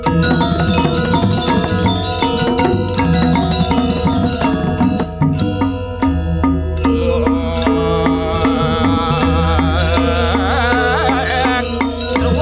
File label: gamelan